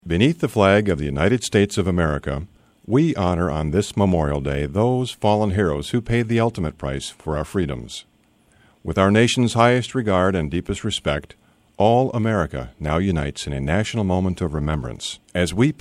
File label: taps without